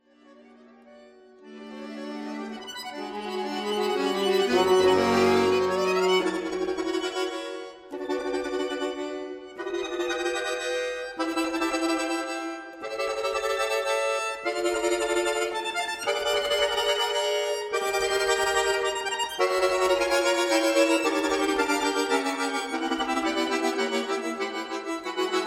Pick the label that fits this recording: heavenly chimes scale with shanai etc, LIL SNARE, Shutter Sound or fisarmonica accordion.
fisarmonica accordion